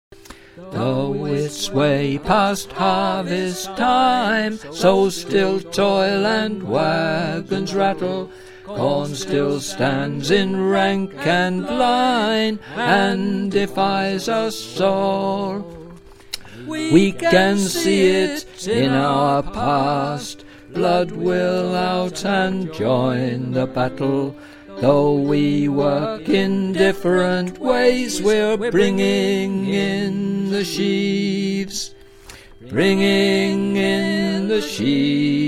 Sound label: Alto, singers